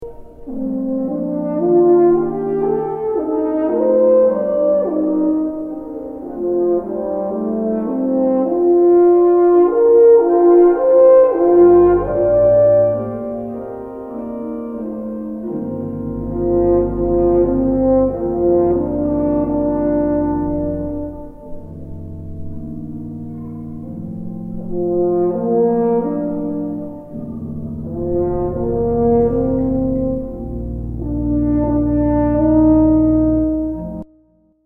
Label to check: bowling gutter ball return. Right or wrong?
Wrong